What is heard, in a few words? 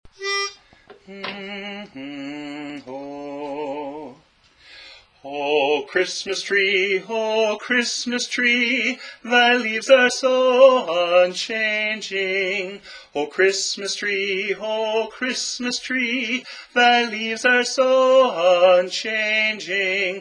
Lead; O Christmas Tree